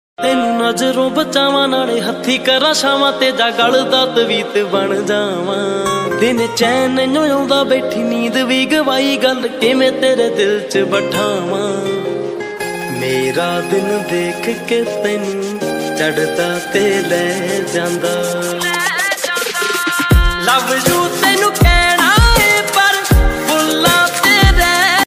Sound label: panjabi ringtone